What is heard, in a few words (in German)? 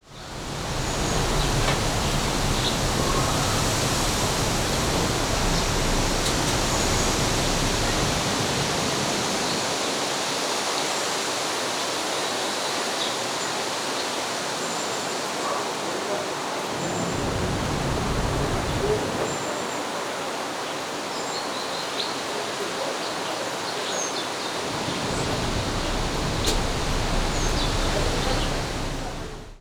highpass